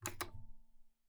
TV Button Press; SoundsCrate

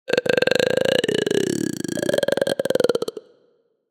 horror scary voices

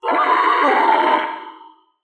raptor roar medium; soundscrate

